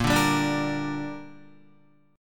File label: Bb 6th, Guitar; strummed